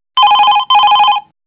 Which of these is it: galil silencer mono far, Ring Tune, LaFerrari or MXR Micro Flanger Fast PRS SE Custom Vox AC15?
Ring Tune